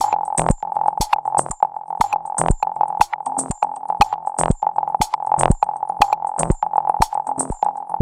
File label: Structured Rhythm, IDM Rhythms